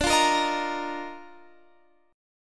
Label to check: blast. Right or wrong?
Wrong